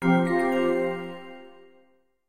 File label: Letters Receive